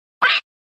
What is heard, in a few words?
squawk parrot